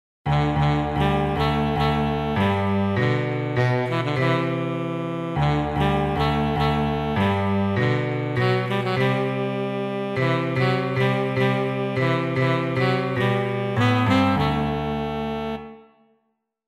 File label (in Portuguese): sax e piano